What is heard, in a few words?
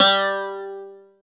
CLAV G3; East West; Phat & Phunky